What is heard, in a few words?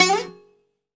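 BZ GLIS UP G, bouzouki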